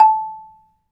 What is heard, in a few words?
MARIMBA; ILIO